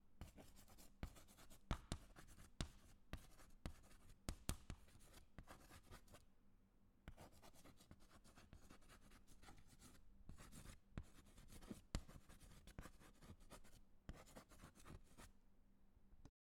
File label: Chalk On Chalk Board